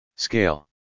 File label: Scale